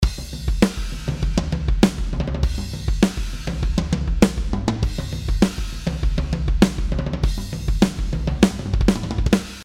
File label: DRUMS